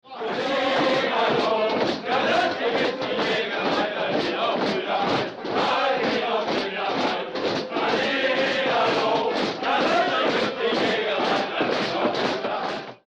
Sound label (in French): les chaises musicales